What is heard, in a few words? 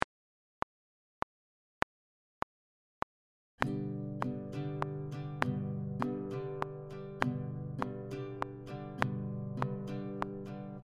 Easy strumming pattern, guitar; Strumming pattern 12